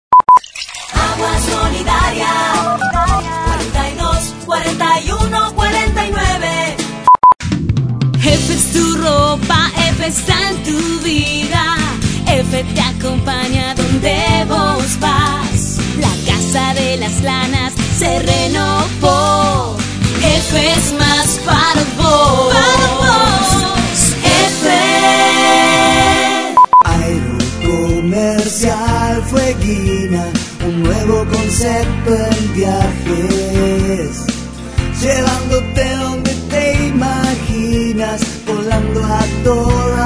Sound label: jingles